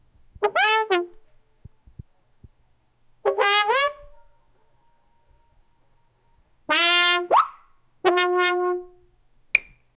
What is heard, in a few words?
drum roll for tiptoe whoos; wah wah